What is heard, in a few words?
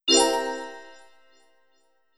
won round